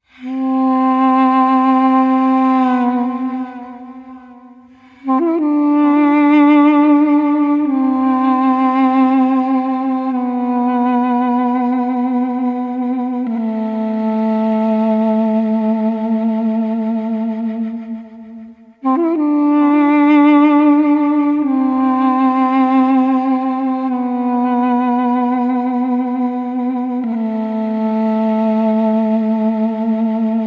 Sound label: flute, Loops, Sad